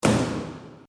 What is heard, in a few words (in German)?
WC Klokabine Tuer